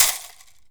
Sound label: PU IL, HAWAII PERC